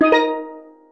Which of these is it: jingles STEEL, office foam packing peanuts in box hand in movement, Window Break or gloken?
jingles STEEL